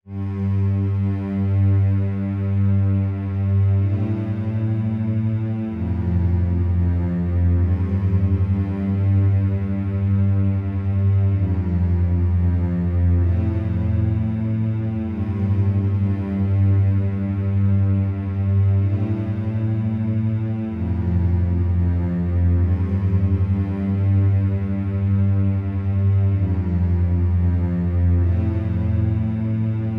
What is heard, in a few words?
slow bass